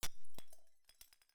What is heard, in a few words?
glass